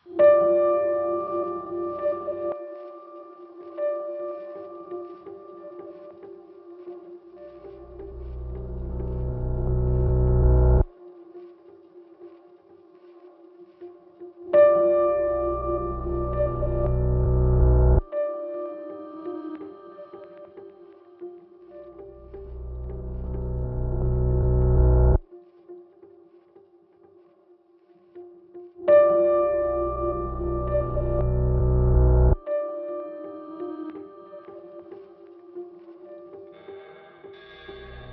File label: Low Tones